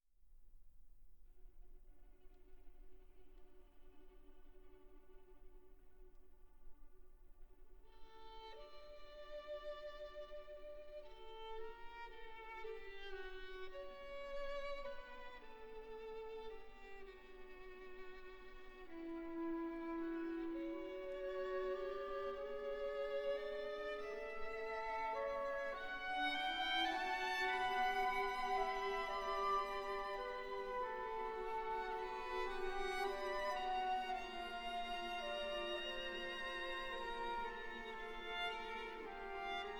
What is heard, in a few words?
Violin Concerto No 1 Andantino; Prokofiev